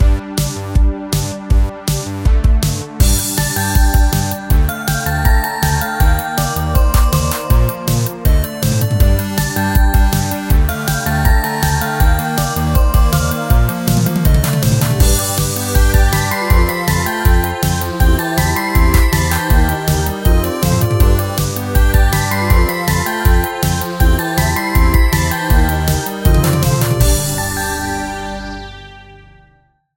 Retrosynth; hiberworld; android

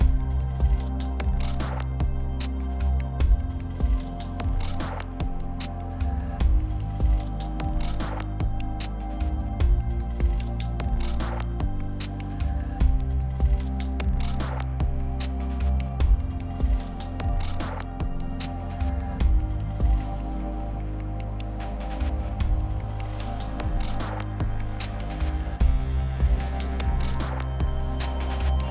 Exploration 8CH 75BPM

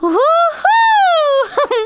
Emote Cheer; the princess and the frog; Twitch Skijump; Geo

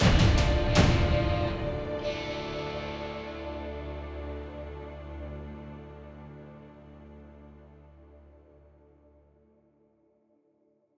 Palace End Stinger; assassin s creed chronicles india; Sum Palace; Sleeman Reached

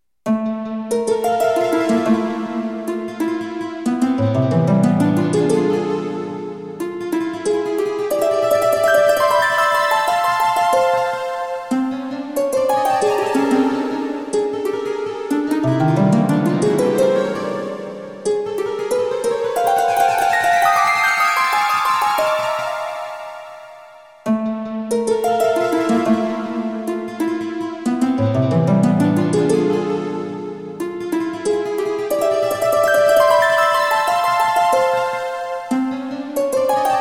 Dungeon; ultima iii exodus 1983

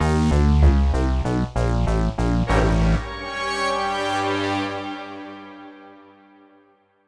boss battle stinger

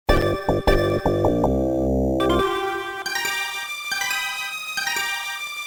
Walking; Flashback; Atari Jaguar

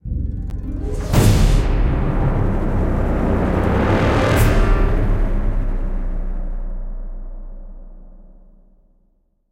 Tense Sting; Jurassic Park The Game

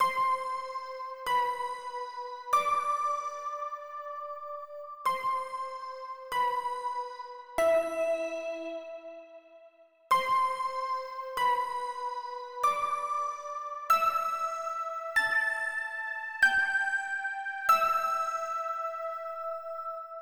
Pluck Melody

